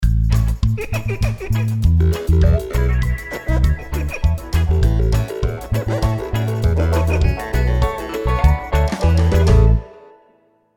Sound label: Musicblock